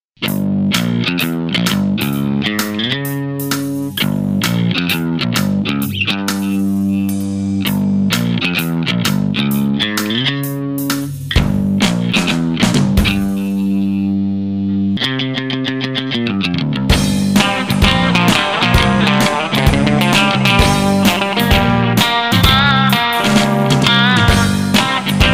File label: BLUES VOICE